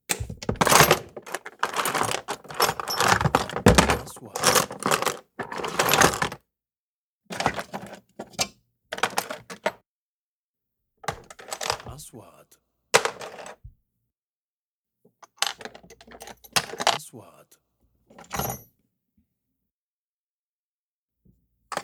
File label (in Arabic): صوت ادوات ورشة نجارة, woods; sound effect